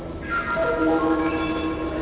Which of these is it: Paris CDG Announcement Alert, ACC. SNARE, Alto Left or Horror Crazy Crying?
Paris CDG Announcement Alert